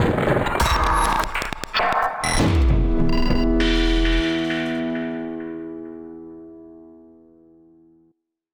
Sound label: death